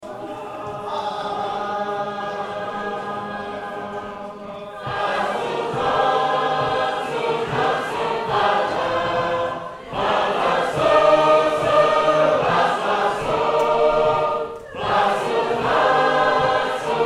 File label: The Dying Californian, sacred harp